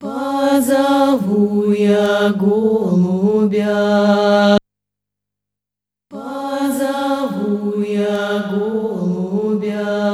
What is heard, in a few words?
3 reverbs